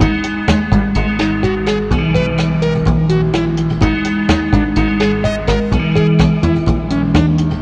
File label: zither beat; ゆめ2っき